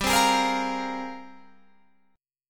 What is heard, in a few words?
G sharp Major 13th